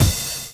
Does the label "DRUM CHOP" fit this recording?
Yes